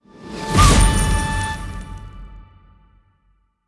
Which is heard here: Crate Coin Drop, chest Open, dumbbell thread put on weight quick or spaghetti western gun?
chest Open